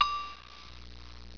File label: GOGO, PERCUSSN; MUSIC